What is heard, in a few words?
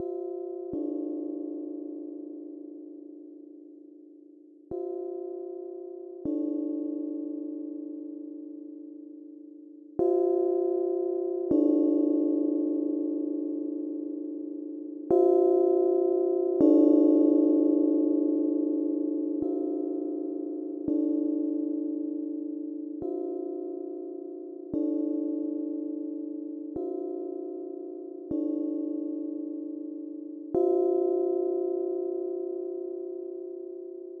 Gong